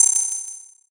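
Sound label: bell; suzu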